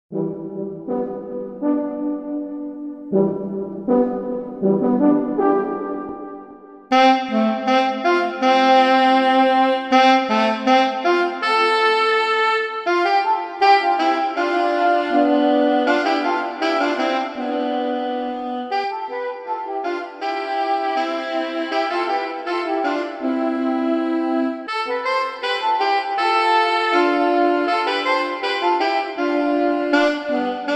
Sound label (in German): Saxophon Mantra